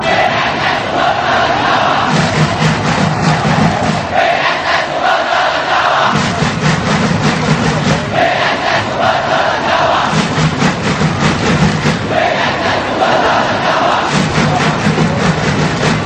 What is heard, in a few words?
clap, fanchants; pss super elang jawa